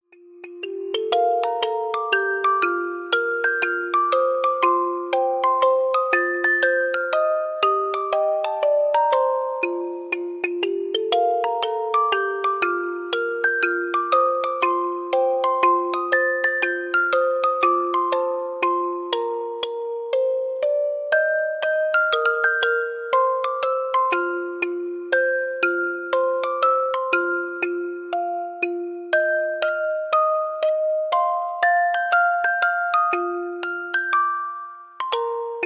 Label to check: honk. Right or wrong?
Wrong